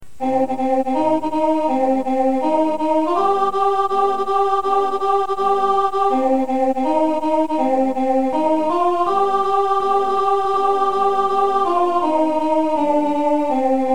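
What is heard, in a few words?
terriers soprano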